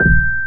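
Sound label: BOX ECHO G4, MUSIC BOX; MSC BOX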